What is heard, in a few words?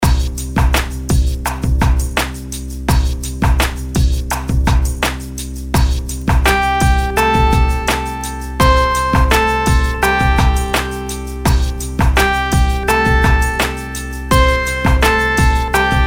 melodies simple Cmaj; 4s; melody